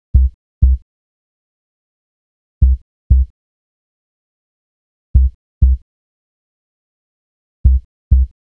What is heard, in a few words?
Bass, Hip-Hop